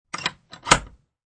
Latch Lock, Sound of a latch lock sliding into lock position.; Latches; Objects